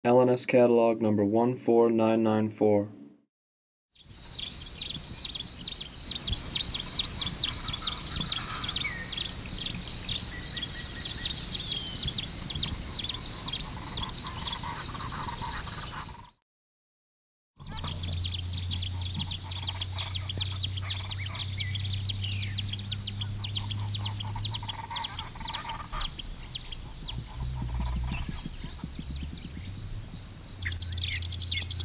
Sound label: black chested sparrow